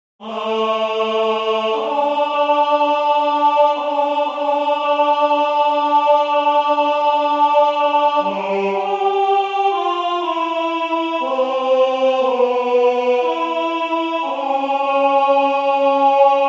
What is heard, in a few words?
verset 1 2 choeurs Alto; Monteverdi; Vespro; Ave maris stella (vespro); Baroque